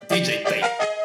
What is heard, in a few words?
dj tape